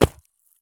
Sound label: landing gravel; Escape from Tarkov